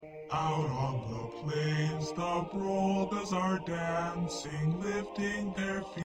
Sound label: Bass; Carol Of The Birds; holiday songs